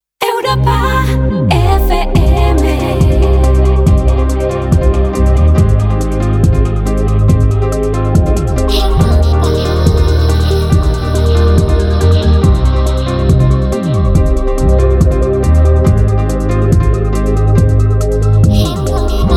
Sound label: JINGLE EDM; CREA